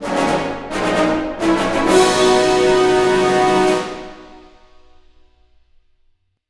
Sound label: Jingle, Happy Horn